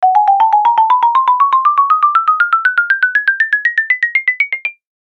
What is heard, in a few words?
cartoon character climb stairs or ladder